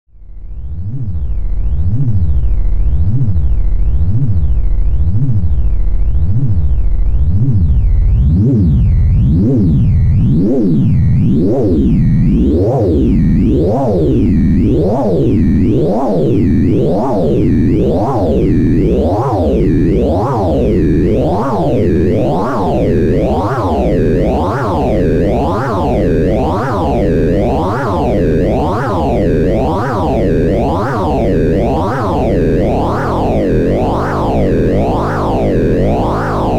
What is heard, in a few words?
KASTLE Noisy